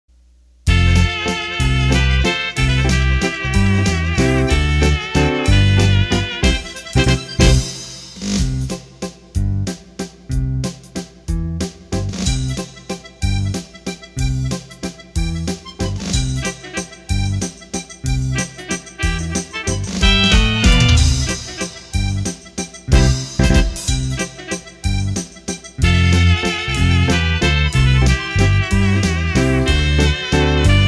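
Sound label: Waltz, Roland accordion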